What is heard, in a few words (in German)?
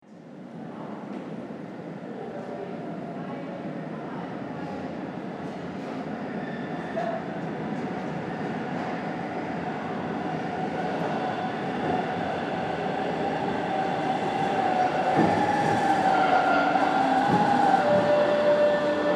S Bahn Ankunft